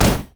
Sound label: displacement flash; LoL; leblanc